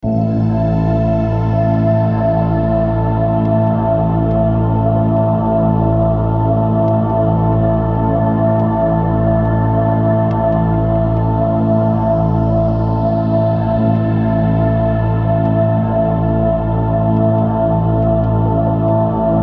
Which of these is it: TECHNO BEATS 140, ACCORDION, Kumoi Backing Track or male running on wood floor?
Kumoi Backing Track